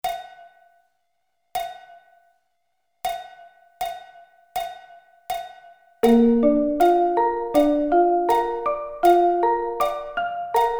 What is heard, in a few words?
Bb Arpeggio Inversions